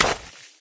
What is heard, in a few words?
splat hit, paintgun; There; ThereClient